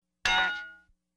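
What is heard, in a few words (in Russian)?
по железу; Смешные звуки ударов для монтажа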